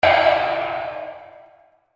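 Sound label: dark cymbal; ecrins; Mars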